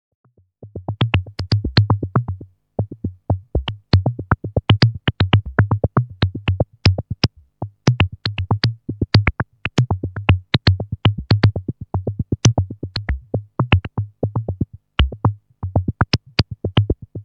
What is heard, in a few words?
bit99 dry env